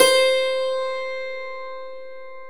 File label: DLCMR C4, Stereo Dulcimer